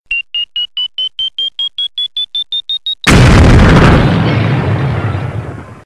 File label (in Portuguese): alerta bomba ativada explodindo